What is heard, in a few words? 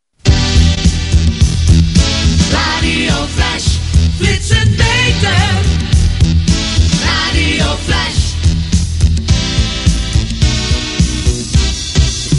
jingle; Flash